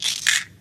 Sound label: pills; Barotrauma; Neurotrauma